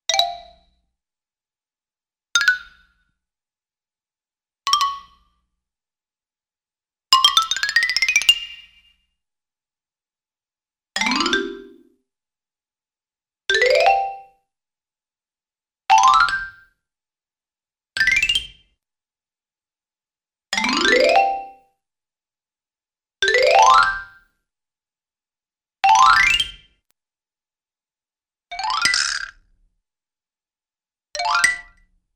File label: Xylophone Hits & Gliss; Cartoon; Hollywood Edge; Cartoon Trax